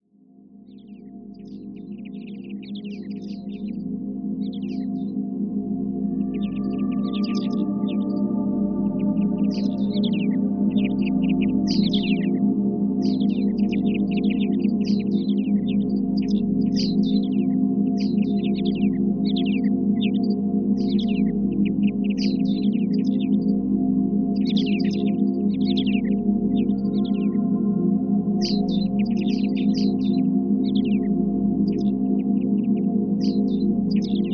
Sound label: Heart Connection Meditation with Forest Sounds